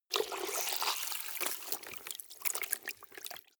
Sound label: clothes water wring hard